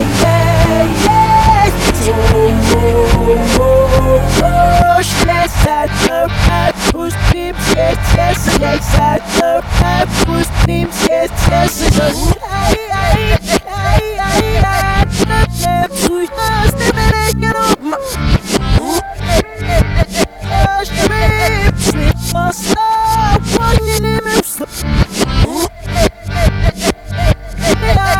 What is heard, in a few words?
Reverse